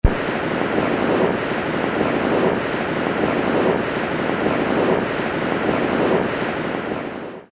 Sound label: Old record end, Casio SK-1 Sampling; Sampling sound